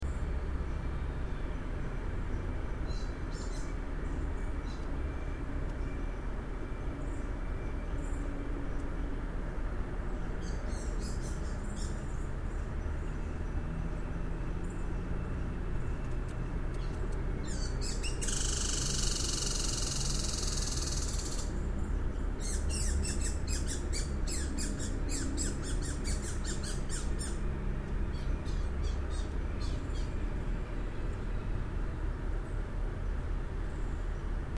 red squirrel

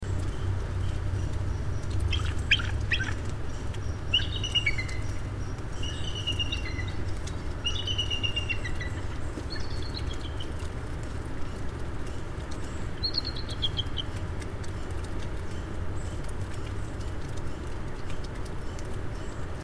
bald eagle

